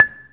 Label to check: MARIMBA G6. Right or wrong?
Right